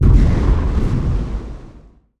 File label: sea ship shoot